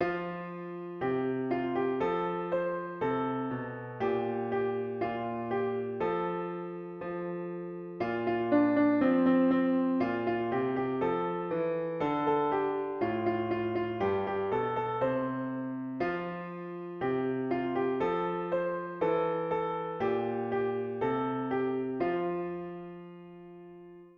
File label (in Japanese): ピアノ